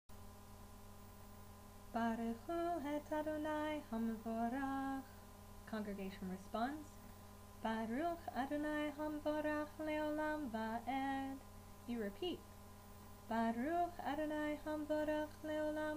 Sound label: Torah Blessings